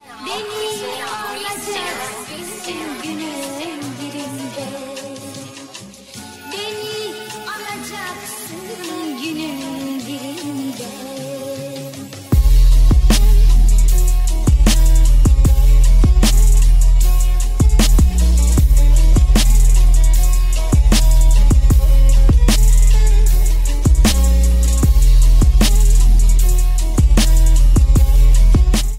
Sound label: Turkish